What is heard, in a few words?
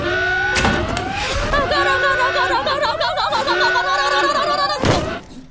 button